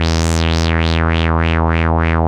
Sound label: WAH WAH E2; CMP - Analogon